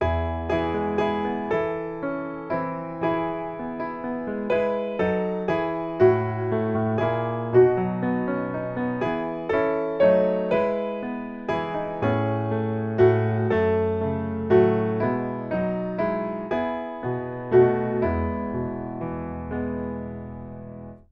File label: piano